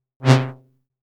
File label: Wide, synthlead